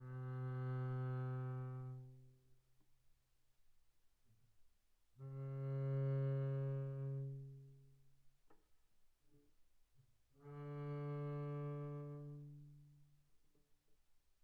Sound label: Bass arco sul G pp C3 B3, double bass